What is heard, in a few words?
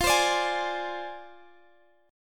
D-Minor 7th Flat 5th, F